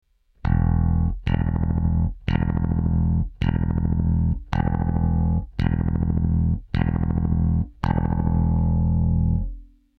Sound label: dbx on bass